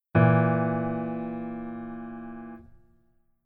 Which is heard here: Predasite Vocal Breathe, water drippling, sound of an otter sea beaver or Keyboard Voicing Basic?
Keyboard Voicing Basic